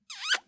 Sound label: kiss; soundscrate